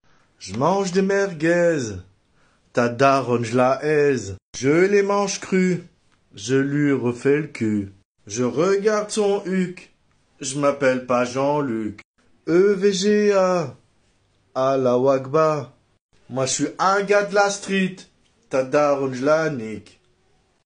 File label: uwu baka songgg